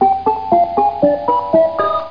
man loopt

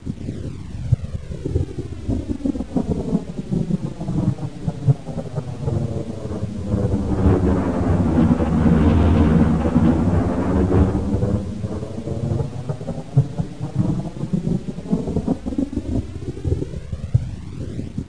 Raket

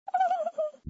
hihi

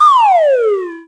spindraad

